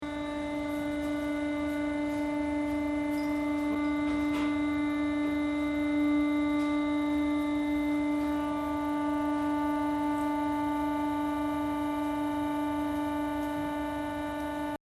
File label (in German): Hydraulik